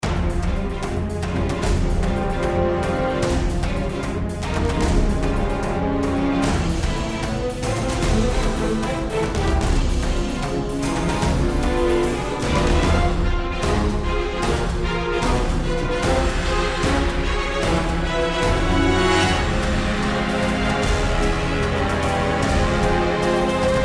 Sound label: cinematic action; hero of sparta; iphone